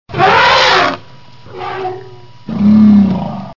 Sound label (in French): elephant afrique afrikaanse olifant; WWF Rangerclub